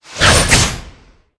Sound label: night fighter attack, monster